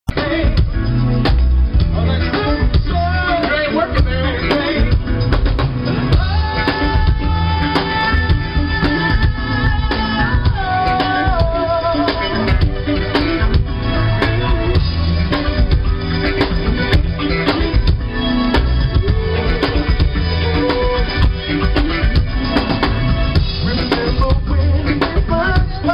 Band

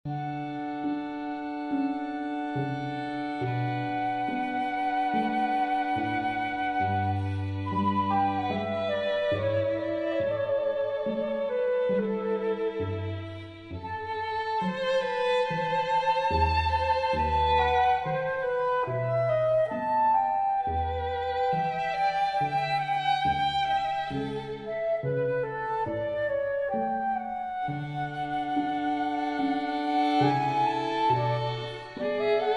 Air on a G String, Chamber Music Trio

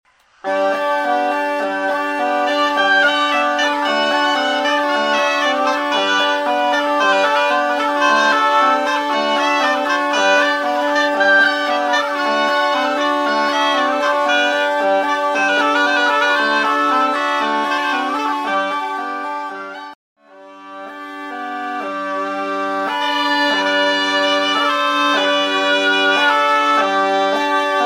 Bagpipes

